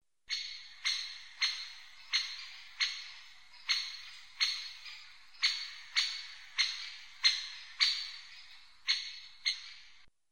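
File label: great spotted woodpecker voice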